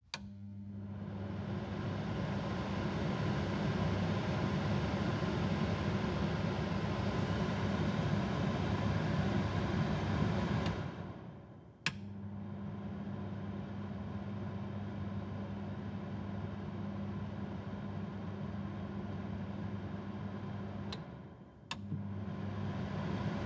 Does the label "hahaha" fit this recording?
No